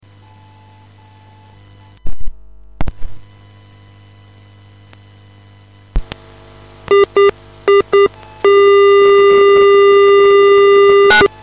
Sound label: acid dtmf